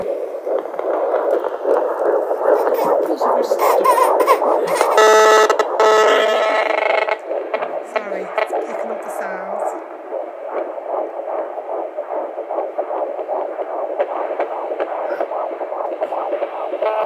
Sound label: Electrified Environment